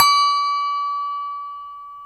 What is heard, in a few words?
BELL C#, TOY HANDBELL; AKAI S-Series CD-ROM Sound Library VOL-7